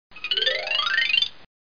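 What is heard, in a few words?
xylophone gliss up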